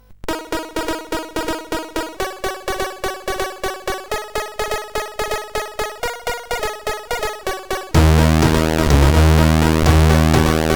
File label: Calm Intro, sid CSG8580R5